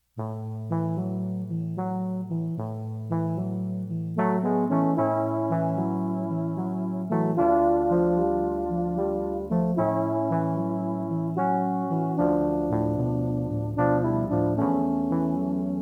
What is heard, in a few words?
4 Trombones; classic jazz; Trombone Quartets, V4; jazz standards for 4 trbns.